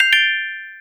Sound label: screenshot; Linux